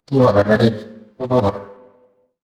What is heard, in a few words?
fire electric arc